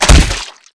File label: body medium impact hard; Garry's Mod; tf2